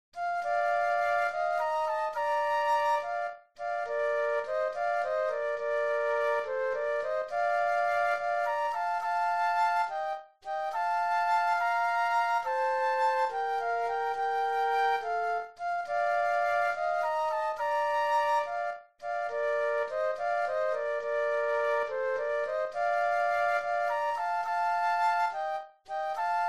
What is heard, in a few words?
Pastorale Flute Duet; classical; Schubert; D. 946 no. 2